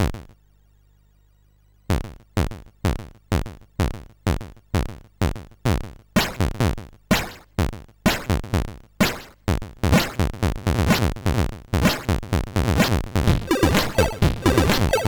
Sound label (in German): Kirchenlied, sid